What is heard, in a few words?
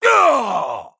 Male Draenei Death